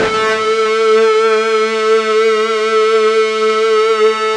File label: fuzz A2